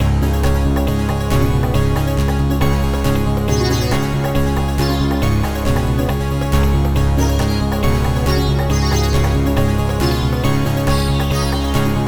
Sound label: hindustani synth, chiptunes